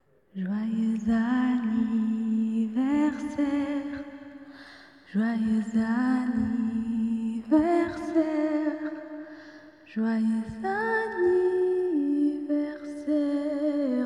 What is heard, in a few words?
Happy Birthday Song in French Female